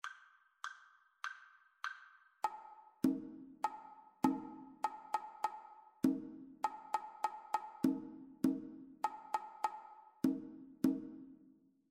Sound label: two part rhythmic dictation